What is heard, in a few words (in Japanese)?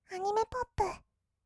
アニメポップ; 1200 kawaii voice!!